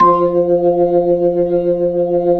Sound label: B-3 Jazz; Keyboards of The 60's and 70's